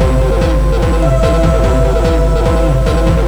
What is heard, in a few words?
drum loop